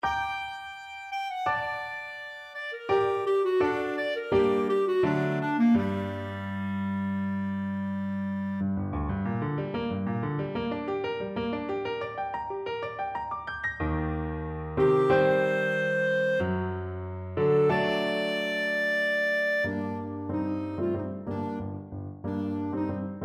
piano, clarinet; grieg

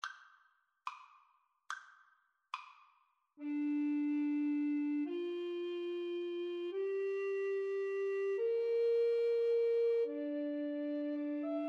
house of the rising sun, Recorder Trio

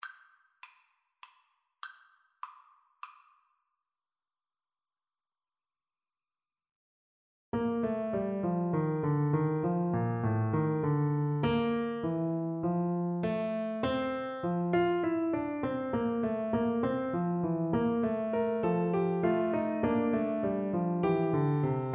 Clarinet

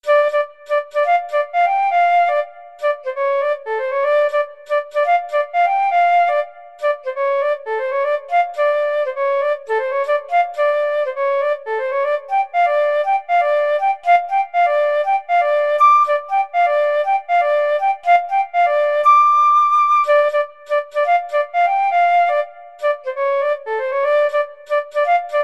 Funk Study, flute

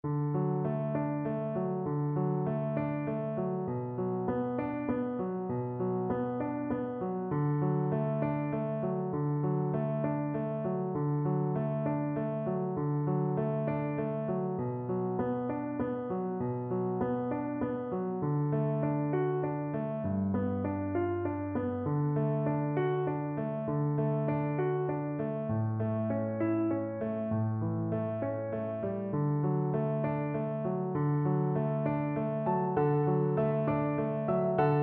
go away viola

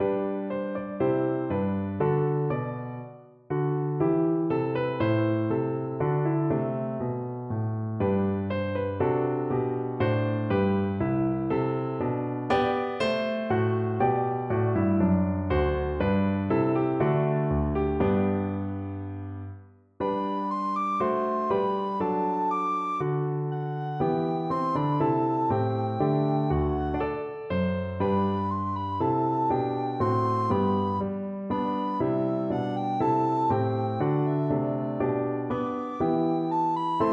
silent worship recorder

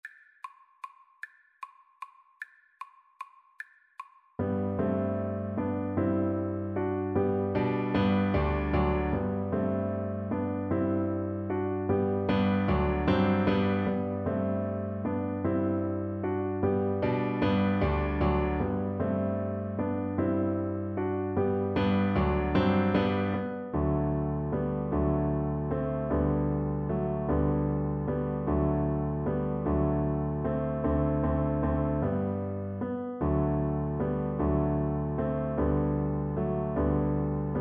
waltz woo viola

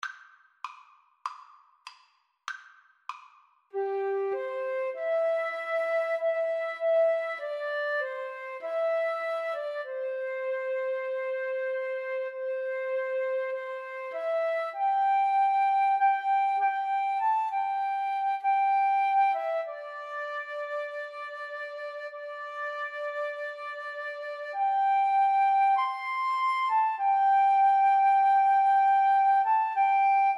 Flute Duet

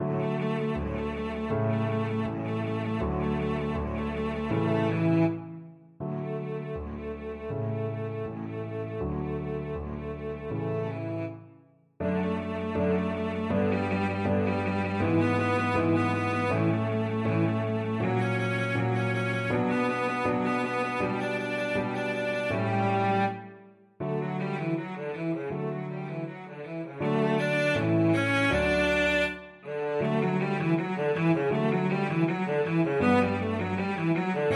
concerto for mandolin in C, cello

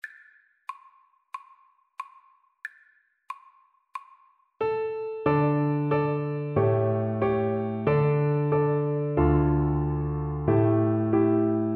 Bassoon

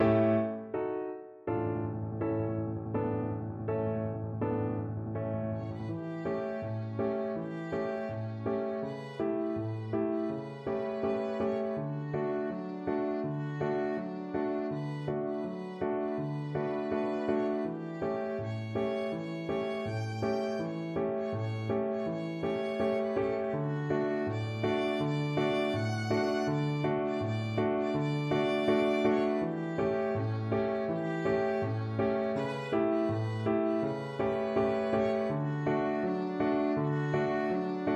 thunder, violin

